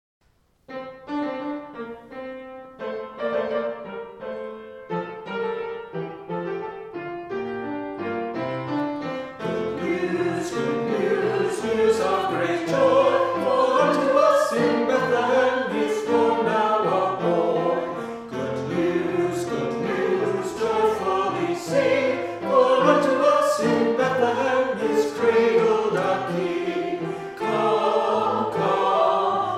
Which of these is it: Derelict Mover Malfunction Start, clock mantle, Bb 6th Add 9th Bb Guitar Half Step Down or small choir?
small choir